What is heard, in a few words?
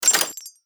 Menu Item Unlocked